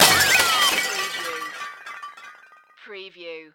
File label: CARTOON BIG CRASH